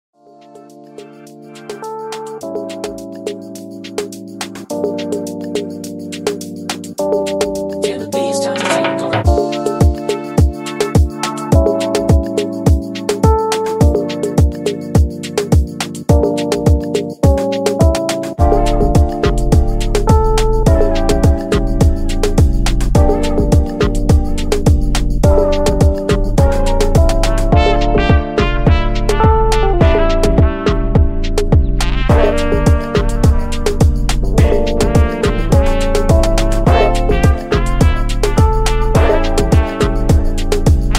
Dancehall Type Beat Afrobeat Reggaeton Instrumental